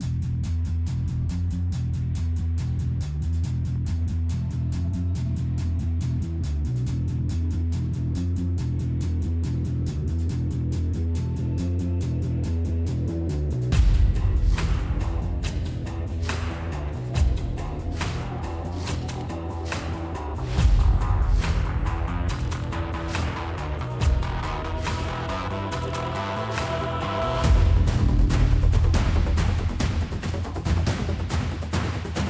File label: Boss Fight In Low 4 4 140bpm; Bang-On Balls Chronicles; ps4 ps5 switch windows xbox one xbox series xs gamerip